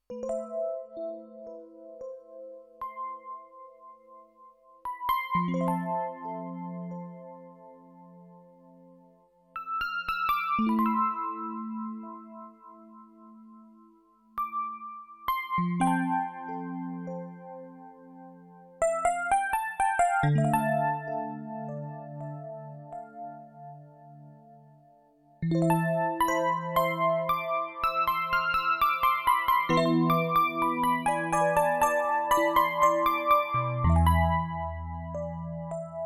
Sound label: Epiano